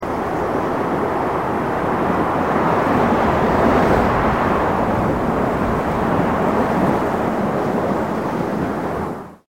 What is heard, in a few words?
WIND